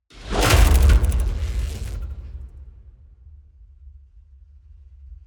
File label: Impacts designed; soundbits